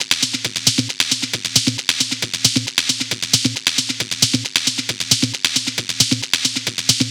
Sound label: Perc Loop 135, Techno